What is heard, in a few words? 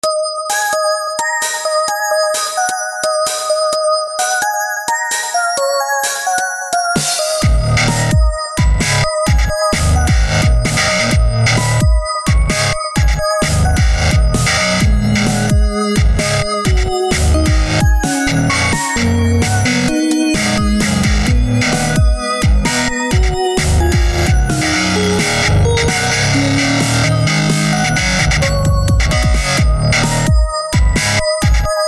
bass git